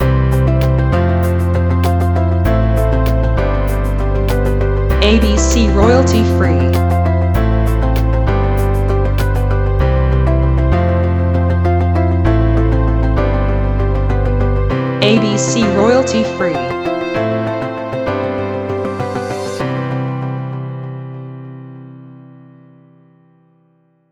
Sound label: Inspire Corporate Business